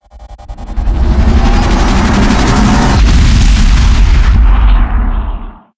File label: leviathan fires; unreal tournament 2004; fav UT sounds